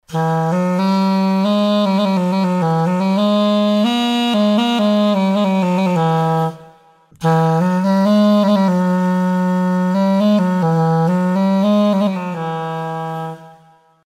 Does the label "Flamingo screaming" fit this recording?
No